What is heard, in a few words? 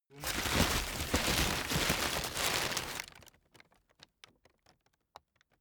stuffing paper put in